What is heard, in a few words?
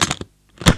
Lock Latch